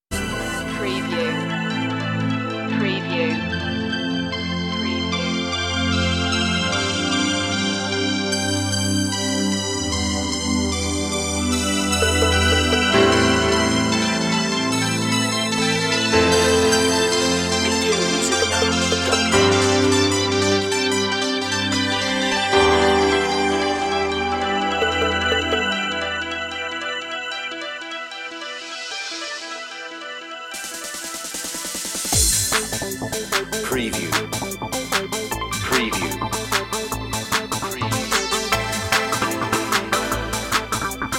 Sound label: add lead guitar